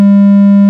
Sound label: 200 Hz; Cerne a bile klavesy